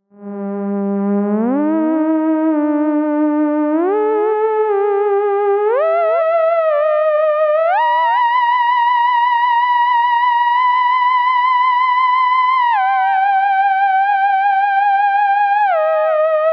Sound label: theremin; theremin audio clip 4